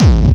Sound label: Bassdrums Hard, Hard Kicks